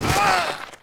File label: FALL, Rider